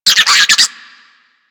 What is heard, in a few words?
Infested Minion Bark, Vocals; Warframe